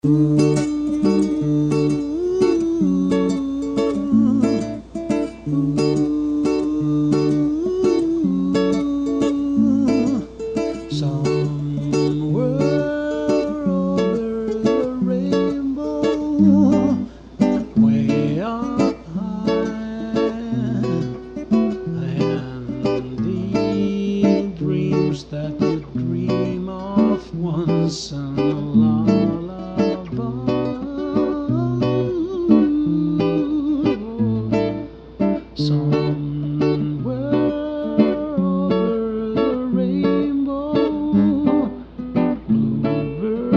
Hawaiian

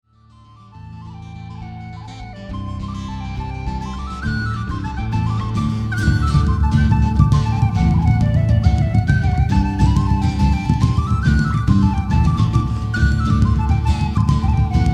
Penny Whistle; Bagpipes

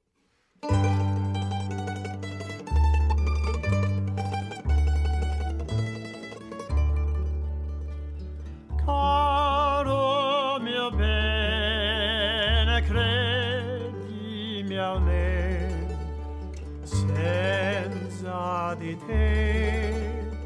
ITALIAN MUSIC

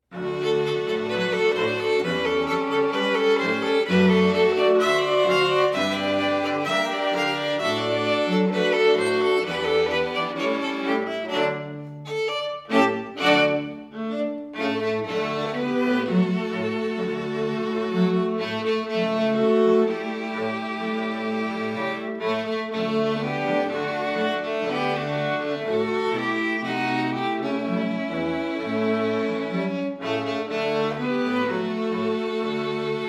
String Quartet

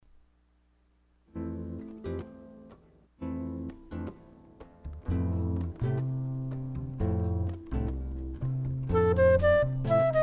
Jazz Harp Trio

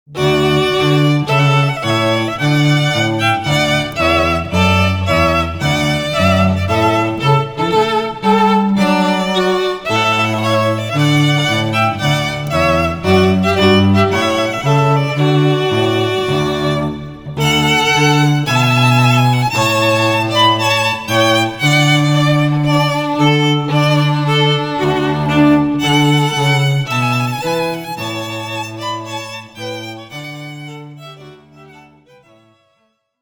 Trumpet Voluntary, String Quartet

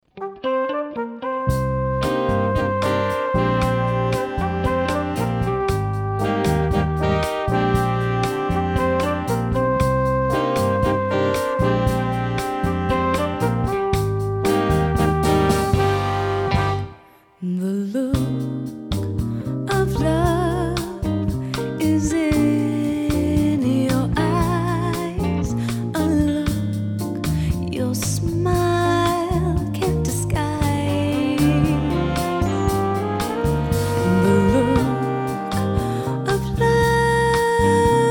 Latin 60s Pop, jazz band

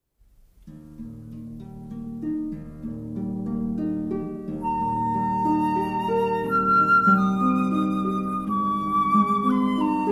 Duo, Harp

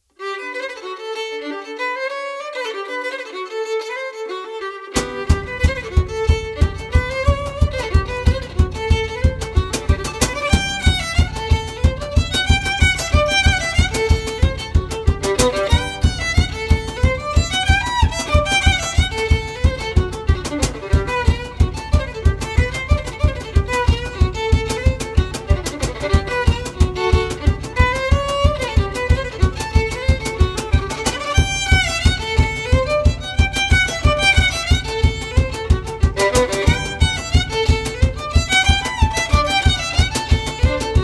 Violin

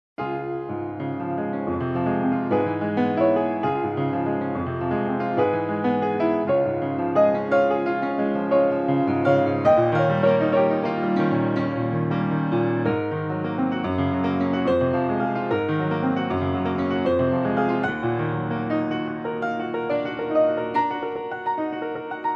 Clair de lune, Piano

